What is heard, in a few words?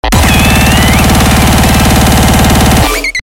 Percussion